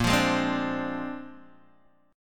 Bb 9th Bb down Guitar Open G

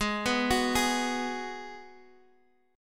Ab Minor 7th, Guitar, Half Step Down

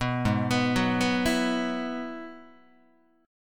G sharp Minor 7th Sharp 5th G sharp down Guitar; G#m7#5 arpeggiated; JGuitar

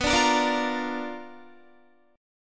B-Minor Major 7th Flat 5th B down Guitar; strummed; 4E,4A,5D,5G,5C,6F

